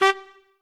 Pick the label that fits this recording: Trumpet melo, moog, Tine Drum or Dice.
Trumpet melo